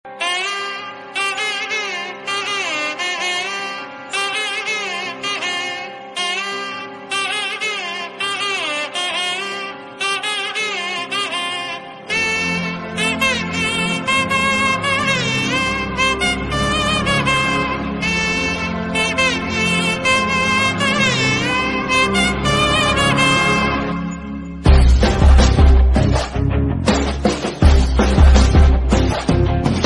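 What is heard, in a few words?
Telugu background music Ringtone; ringtones; Kantara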